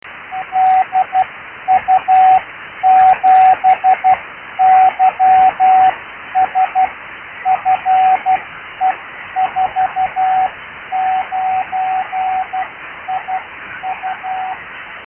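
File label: 50.085 MHz, via TEP, ham radio beacon; LU7YS/b FE49iu Argentina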